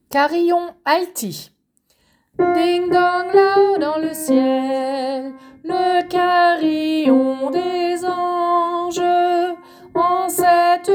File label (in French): Carillon alti